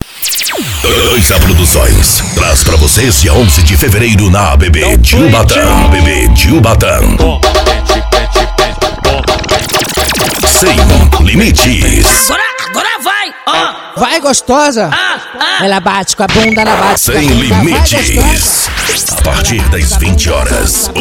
vinheta; studio boneco produções; gazo ubata

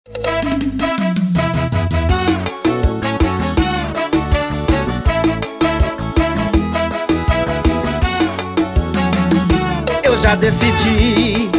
jingles politicos

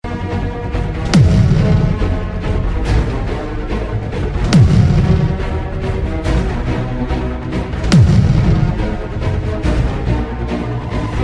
vinheta de abertura

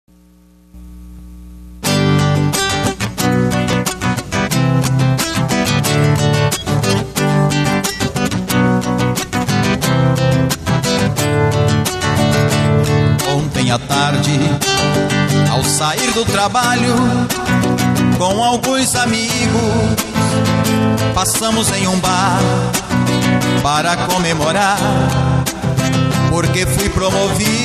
voz violão

